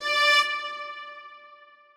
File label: Strings kiai